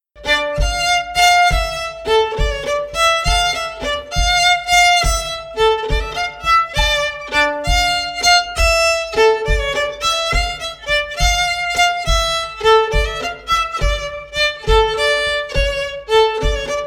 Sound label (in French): Montagnarde; Recueil Hainl; Montagnarde de Murols